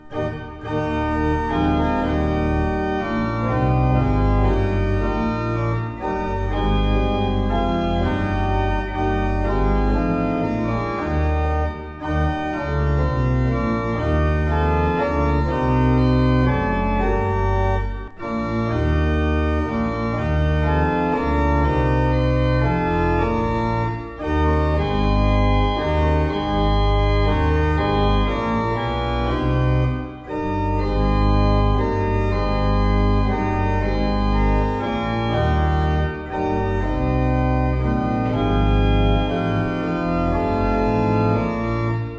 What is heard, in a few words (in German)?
Breil, gebrauchtorgel